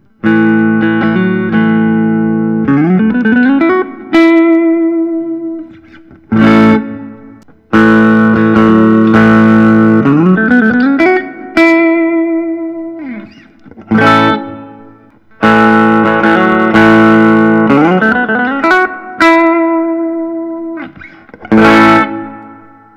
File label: gibson memphis es 60s; warren haynes